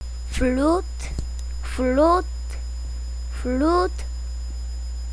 flute

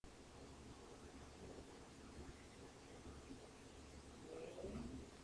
bell

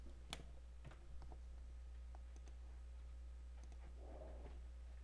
swarm

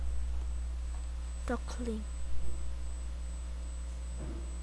duckling

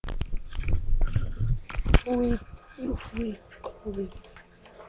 whip

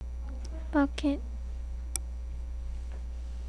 bucket

